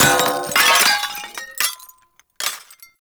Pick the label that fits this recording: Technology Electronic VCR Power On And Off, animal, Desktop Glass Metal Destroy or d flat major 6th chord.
Desktop Glass Metal Destroy